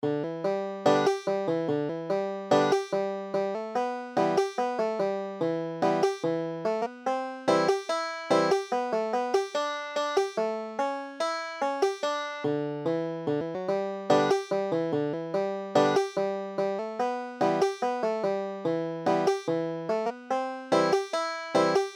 banjo

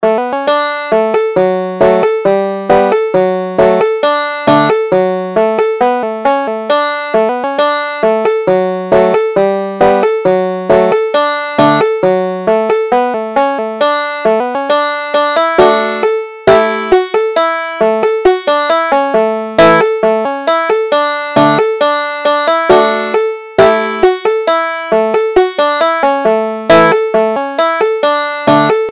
banjo

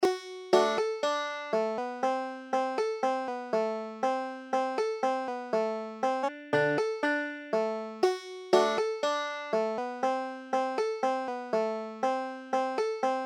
banjo

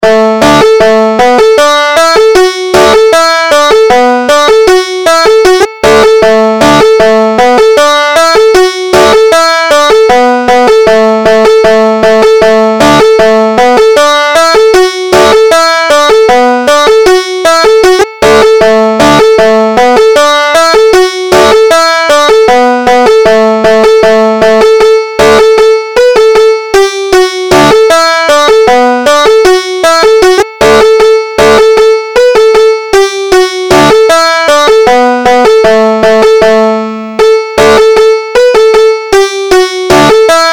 banjo

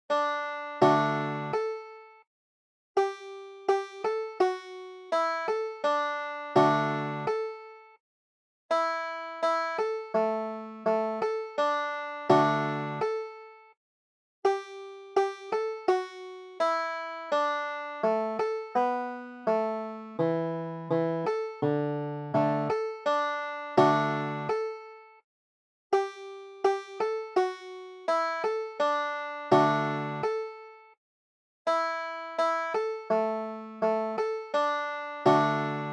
banjo

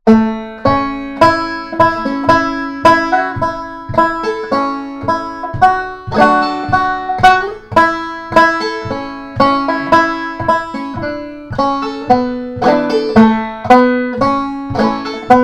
michael row, banjo

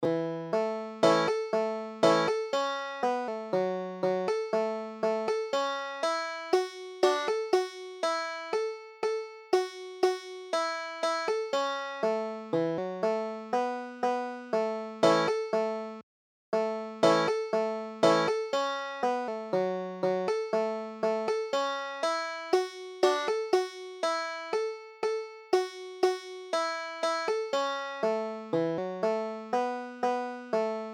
cherokee shuffle, banjo

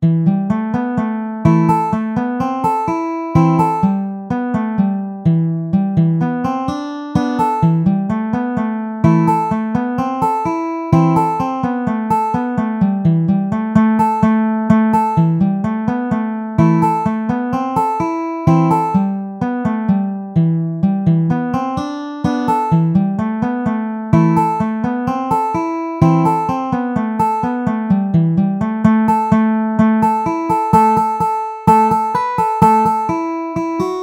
frosty morning, banjo

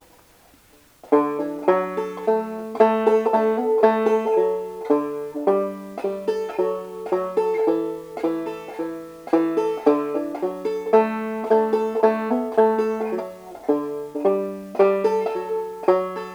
whiskey before breakfast, banjo

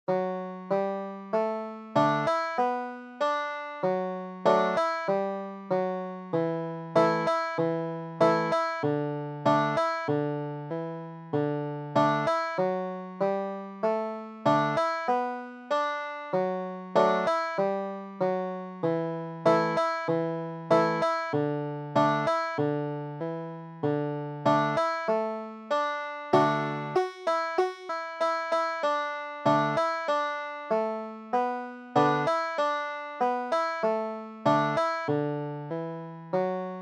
banjo

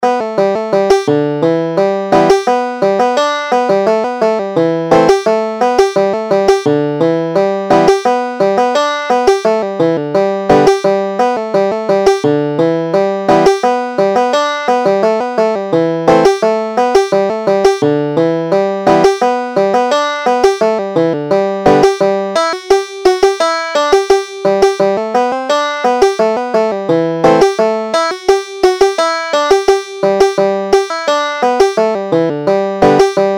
kellys goat, banjo

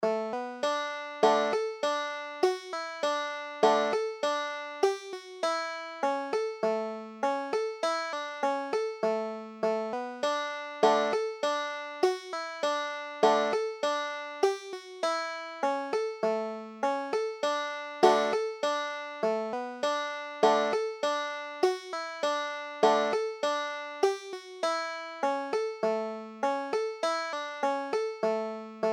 green willis, banjo

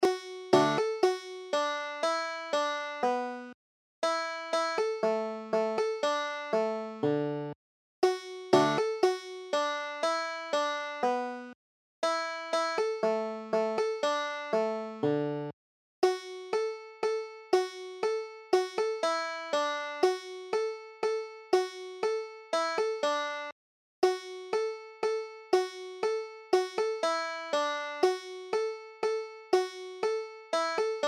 banjo

